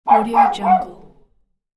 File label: barking dog